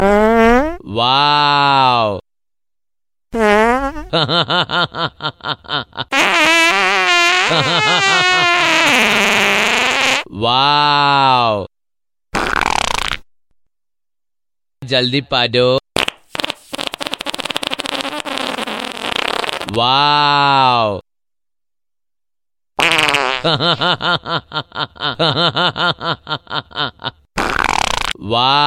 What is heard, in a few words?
New Fart